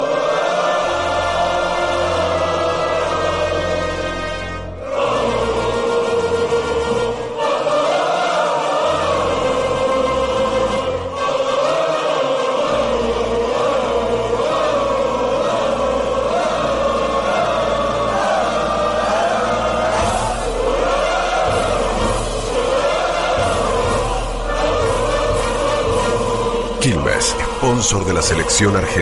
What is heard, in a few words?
argentina himno